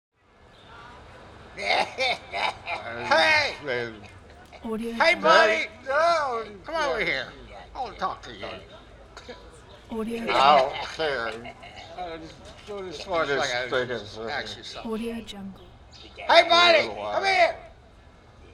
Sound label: drunk walla conversation city ambience